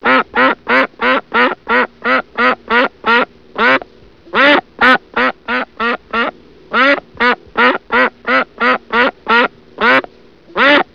ördek vak; hayvanlar

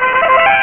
kutlama; ses efekt

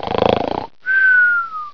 horlama, insan

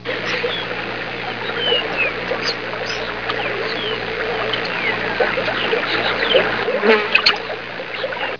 su kuş; doga

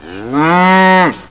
inek, hayvanlar

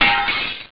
kirildi; ses efekt

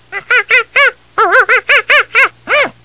maymun; hayvanlar

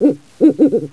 baykus; hayvanlar

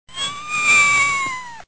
kedi miyav; hayvanlar

